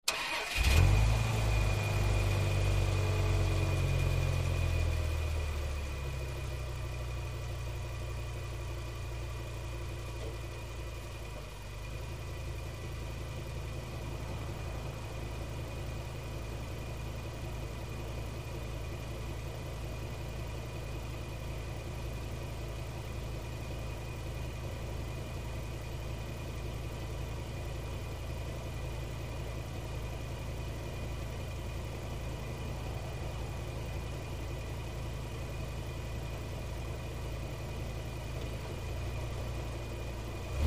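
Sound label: Dodge Ram Various Drive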